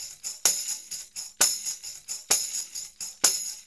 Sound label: tam; Percussion